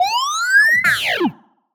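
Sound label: Sci-Fi Droid Robot Emote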